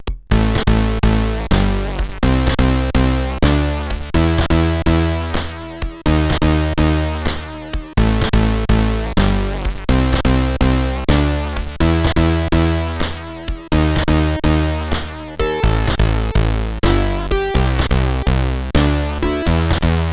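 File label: Lights On, MOS CSG8580R5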